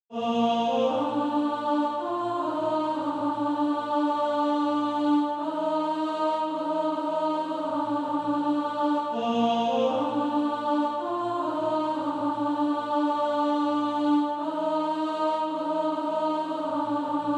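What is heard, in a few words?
soprano, Practice Music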